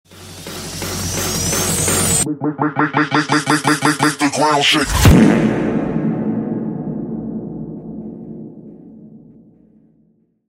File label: fart